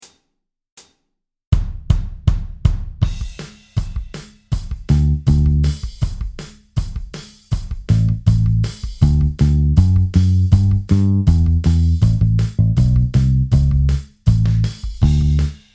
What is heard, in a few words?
orchestra play-along track - 160 bpm; cindy